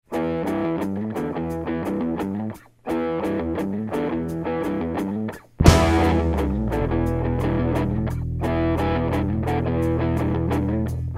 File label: Long Time Rock; Soundzabound Volume 5 Disc 3